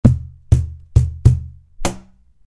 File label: cajon loop 100bpm; creaktor; mozee